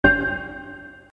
short ambient impact event

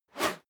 whoosh whistle fast

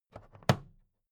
plastic clasp close soft

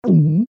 gulp noise

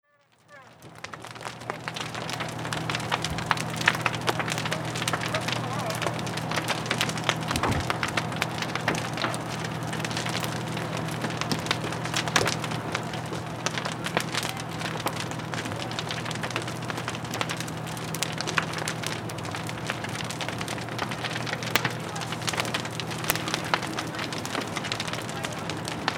large fire crackling

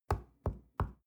stone wall knock soft

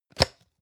plastic can small lid open hard

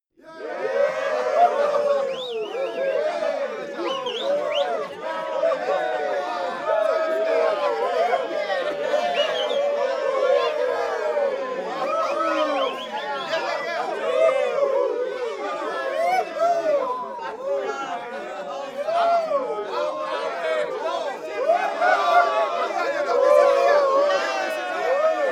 male crowd cheering

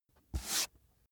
book dust off single

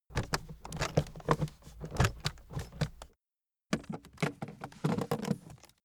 aluminium case handling

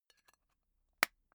tobacco tin close

